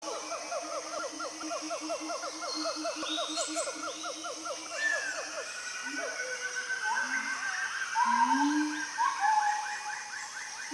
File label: Gibbons Sounds; Cloud Nine Records